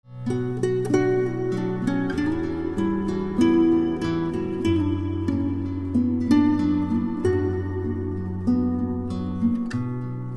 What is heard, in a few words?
Guitar